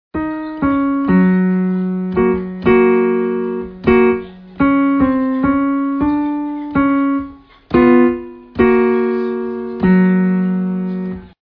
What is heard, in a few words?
piano